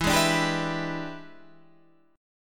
E 7th Sharp 9th Guitar Drop D, chord

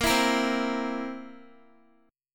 B flat Suspended 2nd Flat 5th chord, down, Guitar; strummed

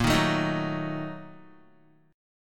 Bb Major 7th Suspended 4th Sharp 5th, Guitar; strummed; JGuitar

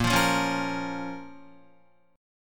A sharp Minor, Guitar; strummed; 4F,4A,5C,5G,5C,6E; JGuitar

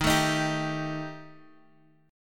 Eb Major Flat 5th Eb Guitar Half Step Down

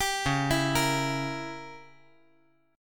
Db Diminished 7th Db down; arpeggiated; JGuitar

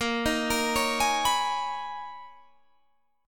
B Major 13th Bb, guitar

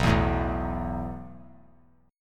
B 7th Suspended 4th B down

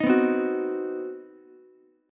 C Minor Major 7th Flat 5th chord, Ukulele; strummed; JGuitar

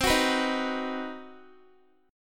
C Minor Major 7th Flat 5th chord, Guitar; CmM7b5; strummed

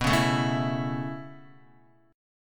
B 7th Suspended 2nd Suspended 4th B down Guitar; B7sus2sus4 strummed; 4F,4A,5C,5G,5C,6E; chord shape; JGuitar

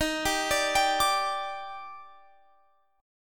D sharp Major 7th, Guitar Open F; D#M7; arpeggiated

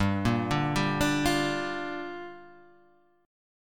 Bb 6th G

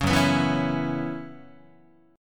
Gb 7th Suspended 4th Gb down Guitar, chord

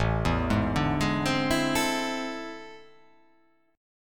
A Minor Major 11th, down

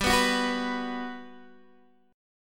G Major 7th, G, down, Guitar, Open D

